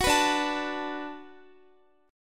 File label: D 6th D down Guitar, chord; D6 strummed; tuning 4E,4A,5D,5G,5C,6F